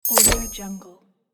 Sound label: unlock transition